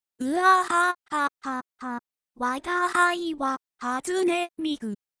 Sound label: haha